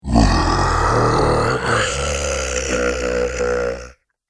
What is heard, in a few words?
Long Roar Of The Monster; Monster Sound Effect